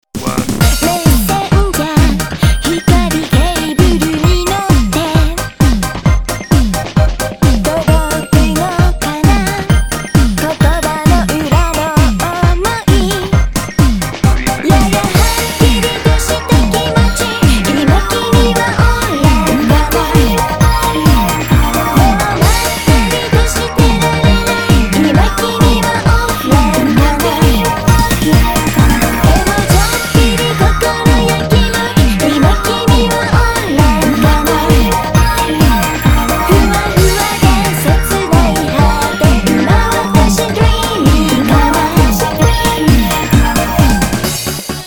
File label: Dreamy FM